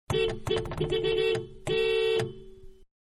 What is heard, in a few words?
Small Car Horn Several Interior; Vehicles; Cars; Manhattan Production Music